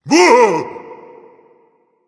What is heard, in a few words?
surprised, voice; Smite; ymir skin6